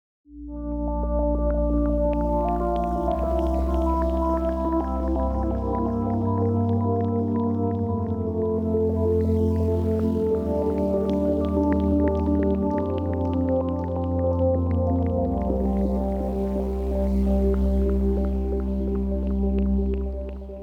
ambient pad ohne hall

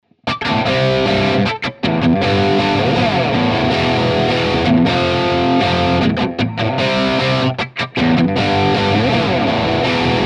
crunch steg, gibson les paul traditional; friedman hbe

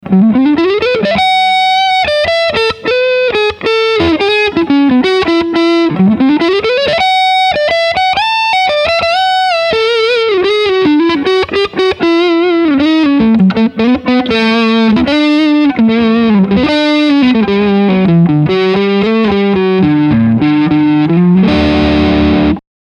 Super Viking Overdrive Hals Pickup; hagstrom

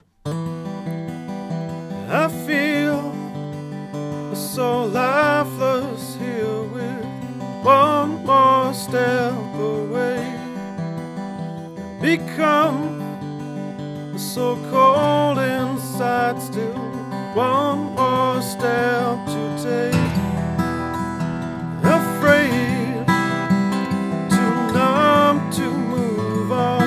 30cm gitarre onaxis

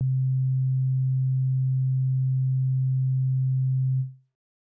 fm-synthese sine; fm-synthese erklaerung

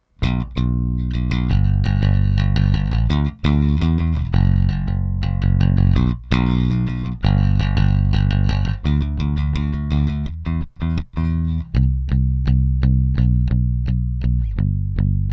trace elliot ELF plektrum

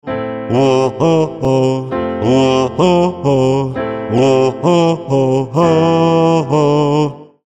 zweitstimme alleine piano; Verdopple die aktuelle Stimme

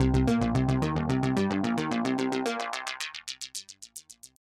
bass focusing EQ aktiv sweep low to high; softube passive-active pack testbericht

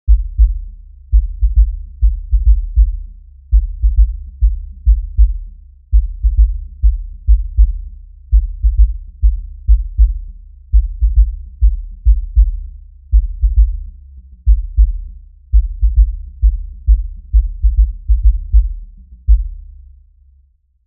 moog multimode filter xl drums low pass 100 prozent; universal audio

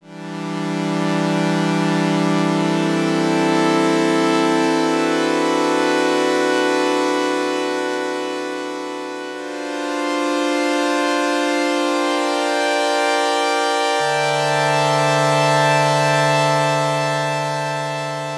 synthesizer strings basisklang; Schöne Synthesizer Strings machen – So geht’s

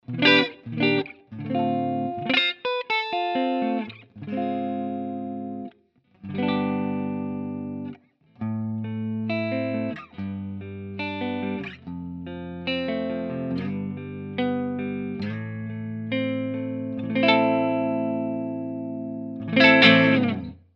clean hals, gibson les paul traditional

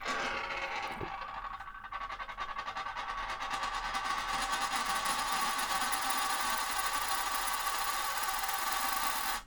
muenze; roland r-26 testbericht

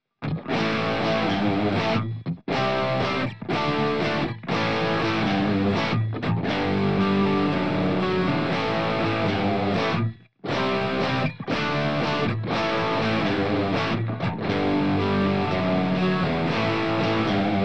Gitarrensound fetter machen Endergebnis; Layering

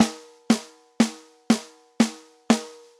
snare ohne; virsyn reflect

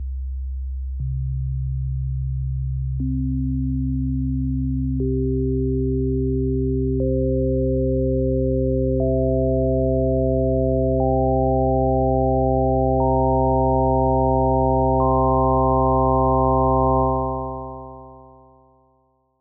obertoene obertonreihe ungeradzahlige obertoene

